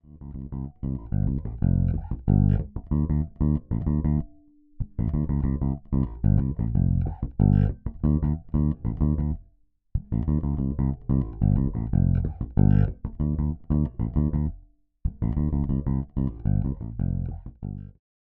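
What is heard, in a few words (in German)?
Fingerstyle Tonblende halb offen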